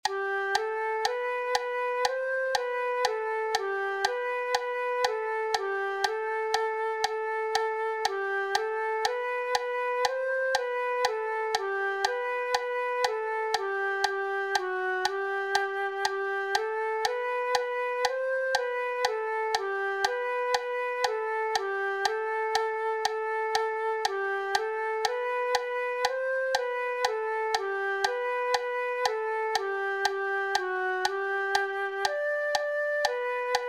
Branle Champagne, Branle; C. Gervaise; Interpretación Proxecto cREAgal

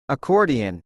accordion

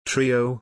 trio

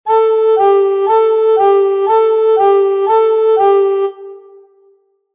recorder audio

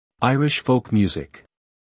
Irish folk music

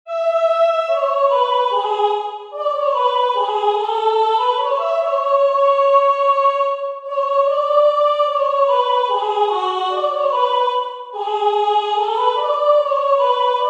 entonacion piano Voice; Só voz; Entoación con acompañamento

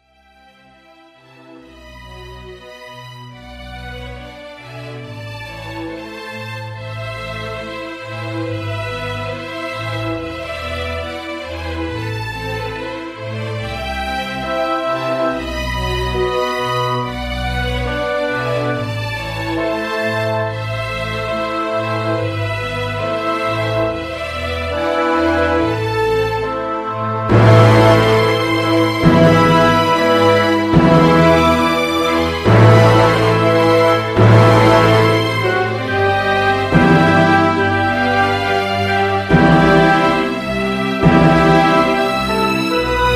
symphony no 7 2nd movement; beethoven

